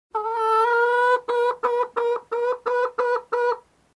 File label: chicken